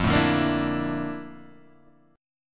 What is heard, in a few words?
A sharp Major Sharp 11th, F